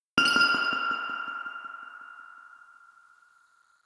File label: Reaktor Drop; Inquisitor 2